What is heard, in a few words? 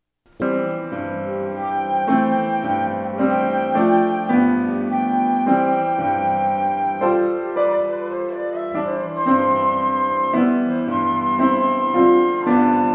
flute; fairest lord jesus